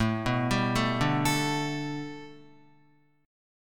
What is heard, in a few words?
A 7th Suspended 2nd Suspended 4th Guitar; A7sus2sus4 arpeggiated